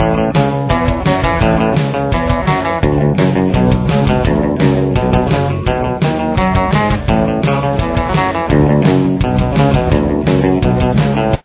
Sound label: full start stop complete